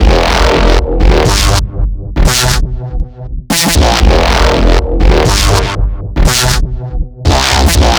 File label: El Distorto, Basses; Sonic Foundry (Sony Creative Software); Modular Electronica